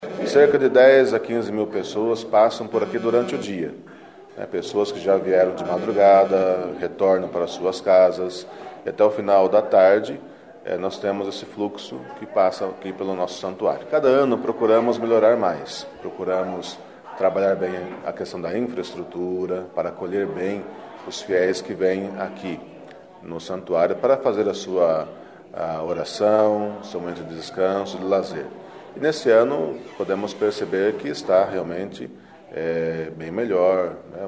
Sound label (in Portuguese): coro